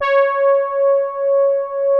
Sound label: POLY SOFT C#5, SOFT BRASS; Music Store - Claudius Diemeter Schwerlos